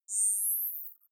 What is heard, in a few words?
zoom out light high; kommunikation; signale